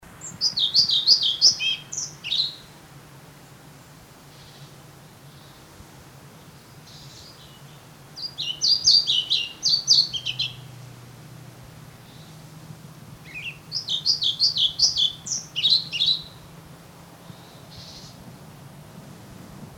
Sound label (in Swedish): Svartvit flugsnappare